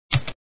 click

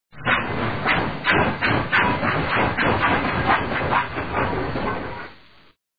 fast chug

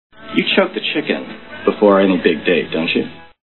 choke

